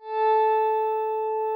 nyckelharpa; SoundBytes - Early Patches

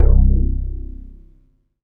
Dance Floor Bass, Multisample Basses

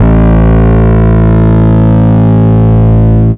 long bass; Jungle

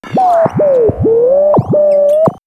Elec FX Sci Fi Creature; X-Static Goldmine

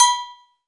AGOGO LO

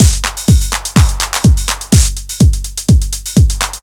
hum hats etc, Deep House 125bpm

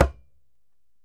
DJEMBE; E-MU Producer Series Vol. 7 – Old World Instruments; Drums&Percussion

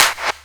CLAP HOU, Dance Mega Drums; Best Service

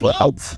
goofy chant, vocal

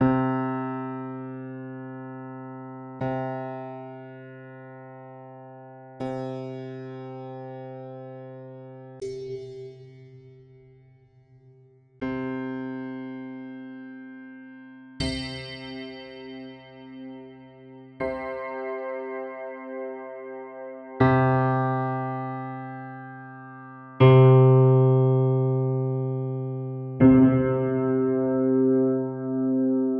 Pianos, monosounds

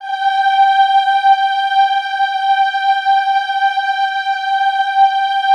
EHH G4, Classical Choir; Best Service; Extended Classical Choir; EHH LO+HI LL

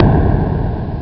drum explos; ST-XX Amiga Soundtracker Sample Packs

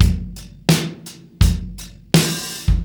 Beat Loops, 084; Zero-G; Monster Beats

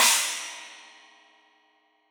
China, Crash, China Cymbal; Deeply Sampled Drums; Cymbals Stereo

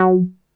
NASTY B, BASSES

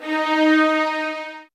SOFT VIOLINS

